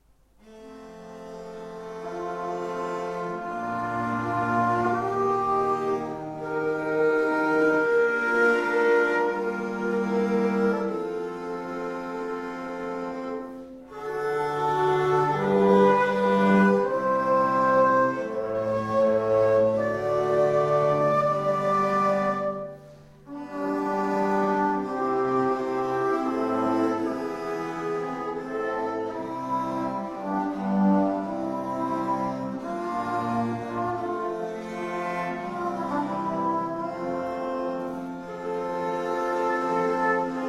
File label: Sibylla Erythraea (instrumental); Lassus